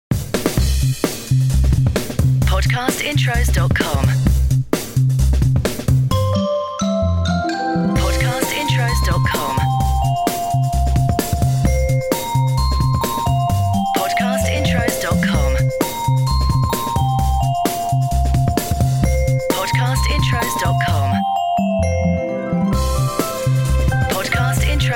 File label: CHILDREN; Podcast Watermark